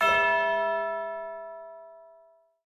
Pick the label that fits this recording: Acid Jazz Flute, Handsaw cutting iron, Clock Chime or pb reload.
Clock Chime